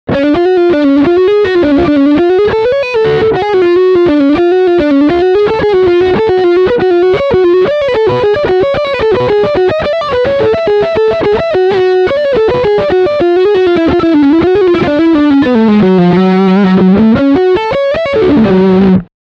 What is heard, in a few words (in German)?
Lick beide Pickups